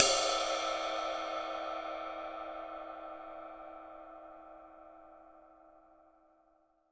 24 Ride; hydrogen drum samples

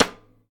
Side Stick Hard

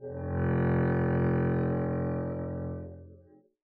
arco f1 mf up, double bass; dsmolken

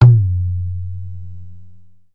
tabla ghe

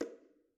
Bongo Hit Muted, Struck Membranophones; Bongos

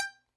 Hohner Special20 normal release G4, harmonica; Aerophones; Free Aerophones

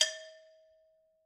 Brake Drum Susp, Struck Idiophones

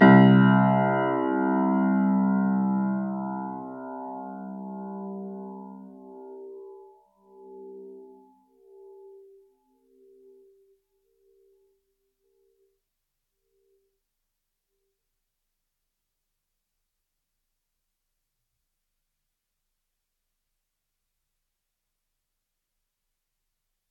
Grand Piano, Kawai, Sustains, C1; Chordophones; Legacy

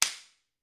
slapstick; Idiophones; Struck Idiophones

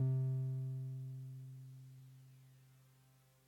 Piano 1 C2, TX81Z, Electrophones

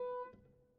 release B3, Upright Piano; Chordophones; Knight; Releases

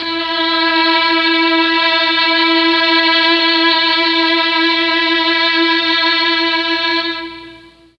E2 3 violins, mellotron; MKII

